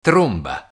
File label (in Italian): tromba